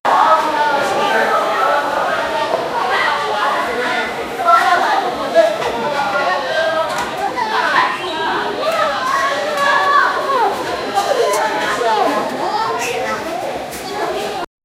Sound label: the sound of crowded indo